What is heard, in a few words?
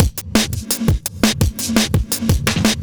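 Drum and Bass Beat 170, drumnbass 170bpm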